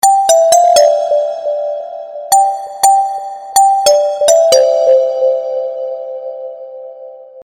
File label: Music Box Msg Tune